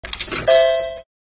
insert coin

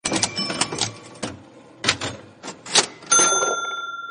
casino machine

